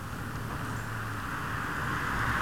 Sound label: Asphalt